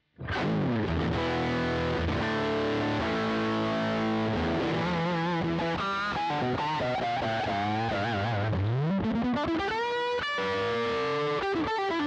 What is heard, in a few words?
Boss Nextone EL34 DIST rev dly